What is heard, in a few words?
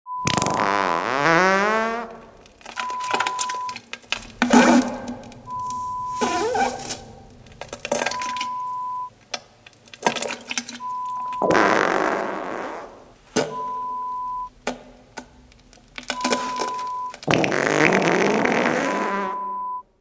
Diarrhea sound